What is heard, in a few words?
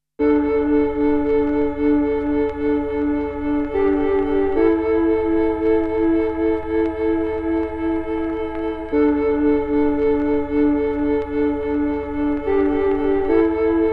synth strings